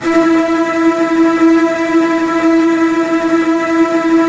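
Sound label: STR TREMOLO, Virtuoso Strings, E3, right; ILIO; Kirk Hunter Virtuoso Strings VOL-3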